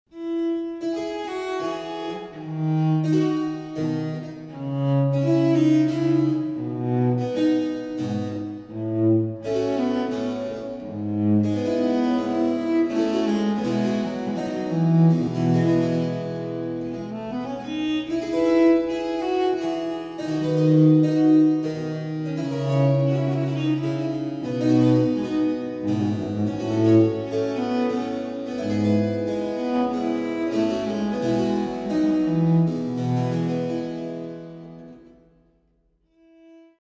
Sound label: pipa